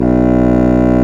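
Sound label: WND Contrabassoons; Roland LCDP04 Orchestral Winds; 90 s Sample CDs; C.Bassoons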